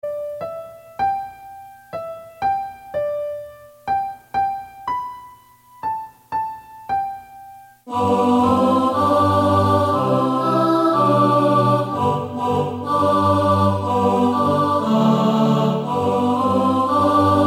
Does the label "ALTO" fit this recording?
Yes